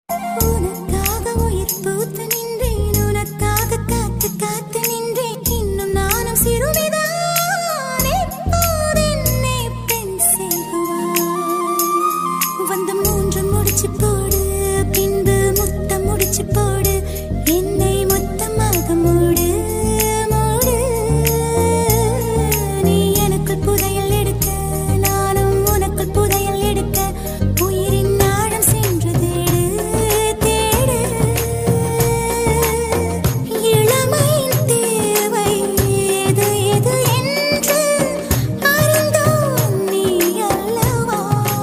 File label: Tamil ringtone